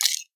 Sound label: stock pull, weapons; garrysmod